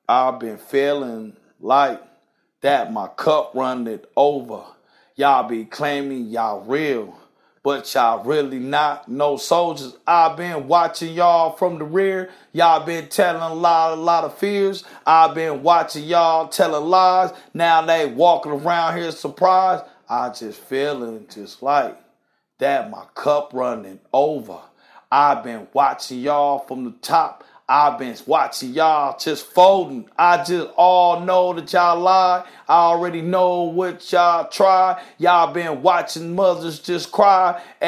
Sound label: hip hop, 96, Fm, Acapellas